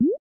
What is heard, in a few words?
hulahoops touchdown; LG Wine Smart